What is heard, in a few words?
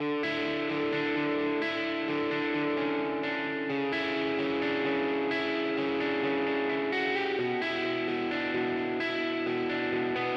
STEREO GUITAR LEFT GT